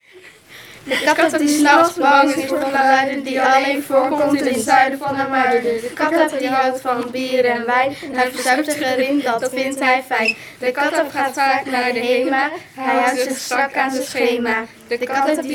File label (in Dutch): rap